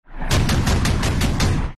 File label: gun; sfx lib rec